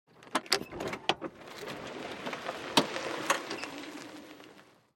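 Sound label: golf cart changing gear and steering; mono; soundscrate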